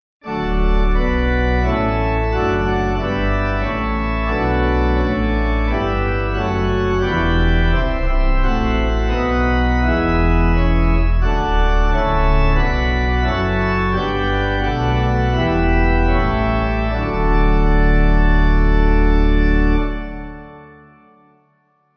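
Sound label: Kyrie Pipe, Pipe Organ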